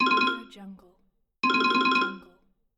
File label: the computer notification